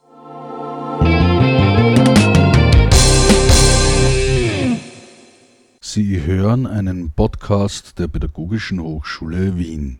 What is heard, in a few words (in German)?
Jingle anmod